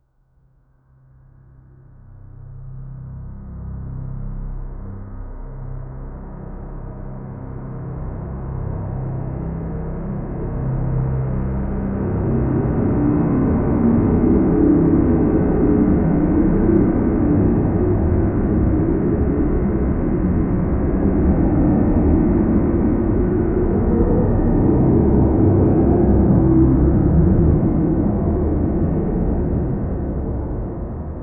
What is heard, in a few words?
positive sonic logo; branding